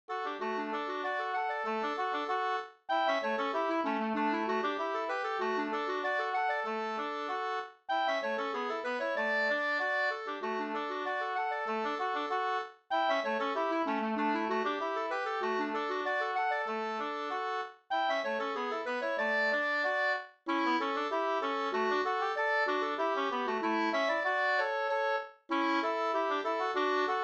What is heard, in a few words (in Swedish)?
folkdans kadrilj